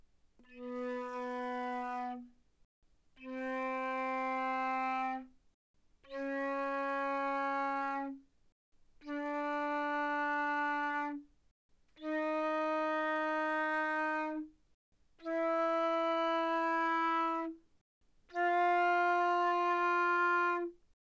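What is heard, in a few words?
Flute non vibrato fortissimo B3 B4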